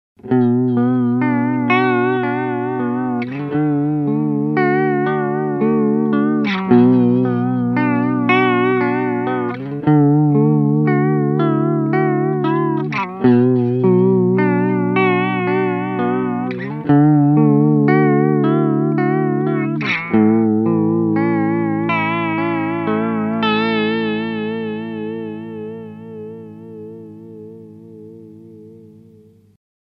EQD Aurelius width 12 uhr rate slowest balance maxed; Mode V